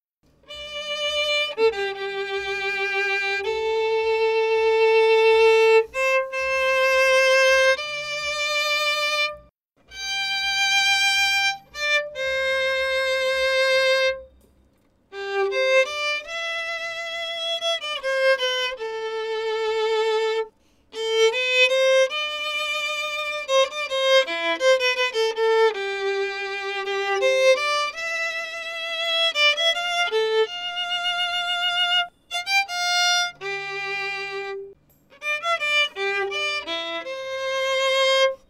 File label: violin